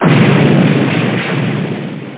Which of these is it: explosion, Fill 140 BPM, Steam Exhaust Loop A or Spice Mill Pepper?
explosion